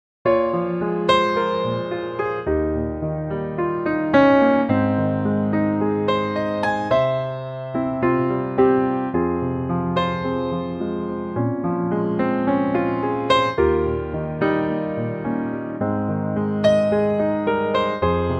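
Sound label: F minor piano; Pianotrak; key - Fm (Ab) - vocal range - Ab to Bb (original key); Gorgeous piano only arrangement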